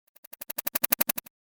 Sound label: Paper Helicopter, cartoon; Miscellaneous Cartoon Sound Effects